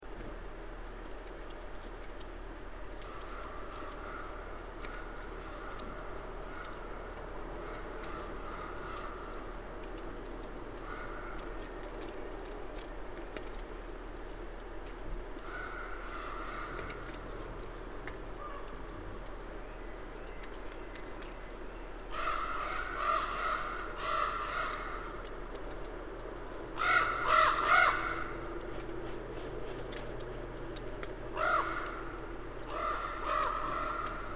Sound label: raven flyover